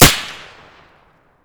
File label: m590 suppressed; gmod; arccw go